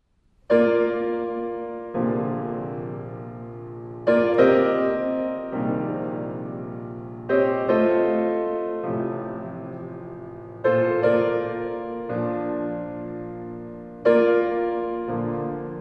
Piano Improvisation

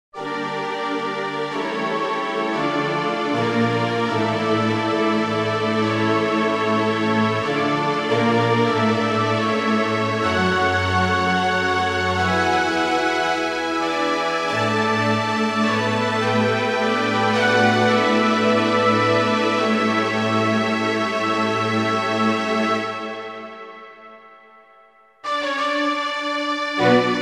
Orchestral Stream

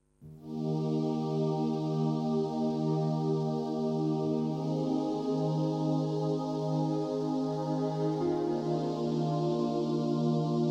Piano Intermezzo

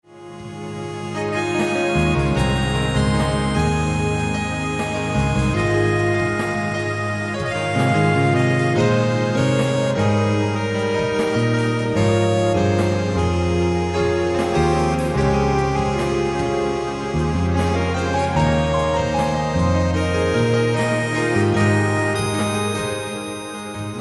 Saxophone

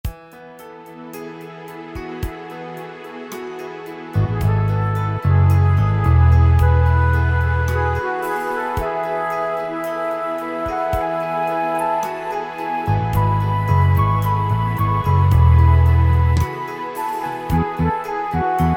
Two Flutes

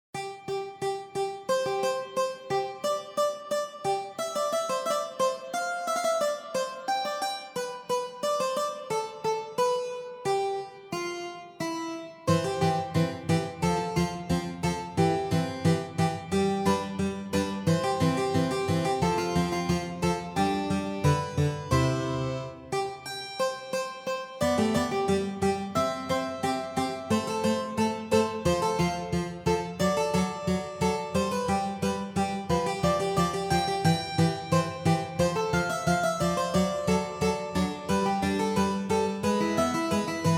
Harpsi Etude

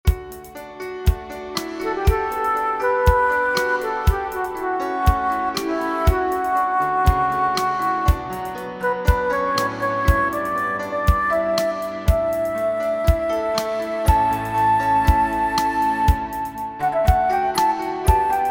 Solo Flute

